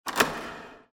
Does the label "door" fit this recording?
Yes